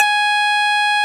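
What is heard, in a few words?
Guitar Mute C4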